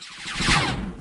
teleport out; RobotRescue; music

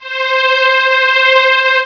slow strings C5; Star Trek JR Audio

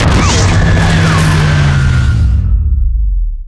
power node destroyed; DAoC

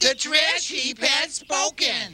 trash; Fraggle Rock Season 1 Bonus CD

